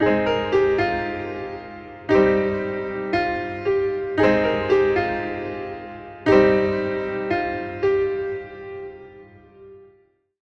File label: piano verb